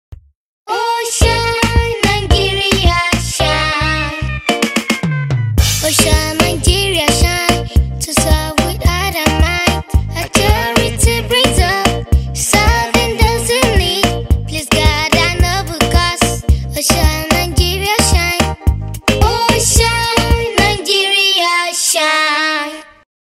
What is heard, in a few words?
Jingle Singalong